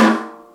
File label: distant, Snares Knocks